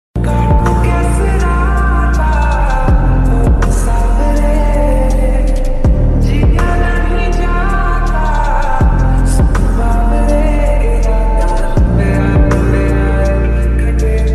english ringtones bgm ringtone

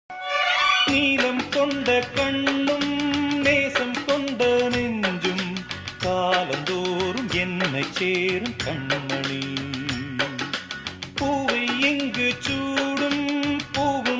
tamil old melody songs

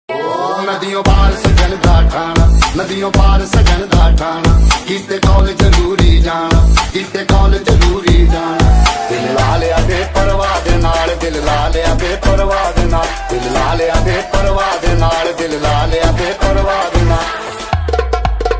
song ringtone; roohi movie

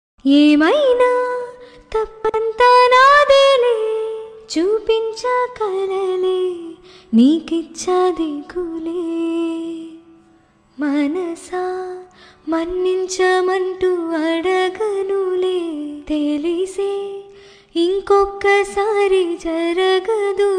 song female version; hi nanna movie; nani mrunal thakur

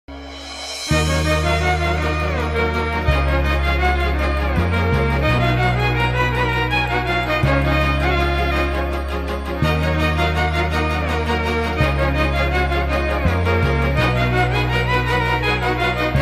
emotional bgm

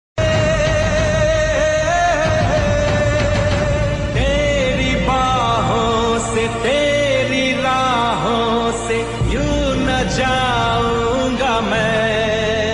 ringtone

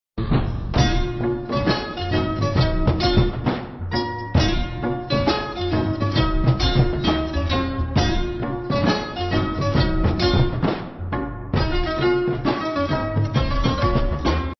ringtone violin ringtone bgm theme

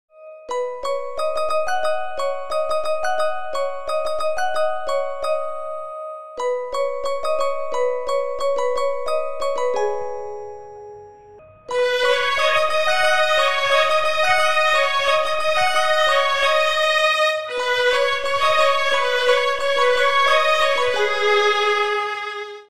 background music piano cover; super khiladi 4 movie

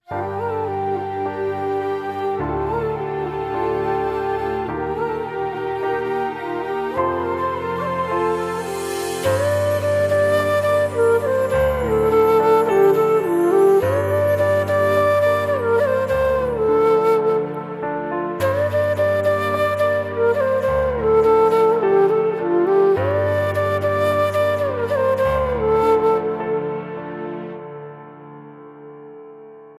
flute ringtone

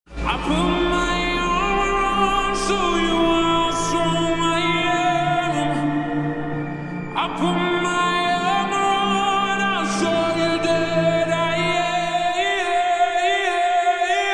slowed reverb ringtone

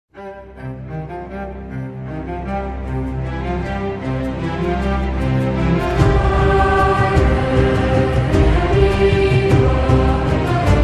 ending bgm ringtone; house of the dragon

